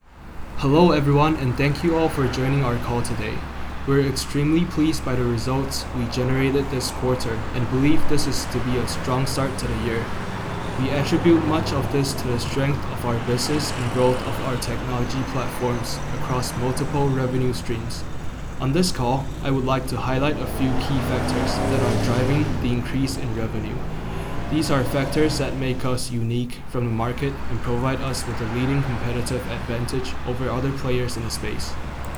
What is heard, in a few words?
street noise Feature OFF